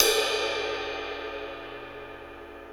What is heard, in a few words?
Rie Ride, Cymbal; KJ Sawka; Drum n Bass & Beyond; Drum Tool Box